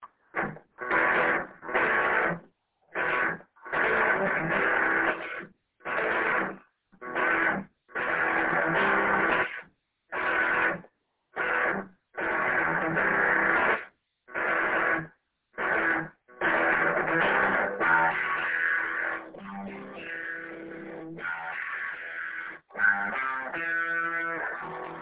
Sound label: metal intro chords